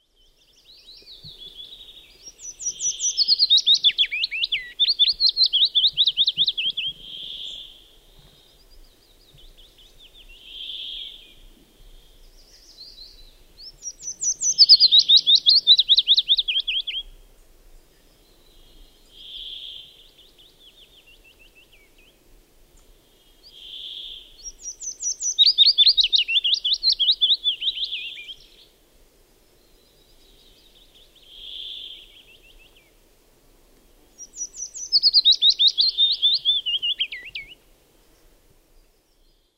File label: willow warbler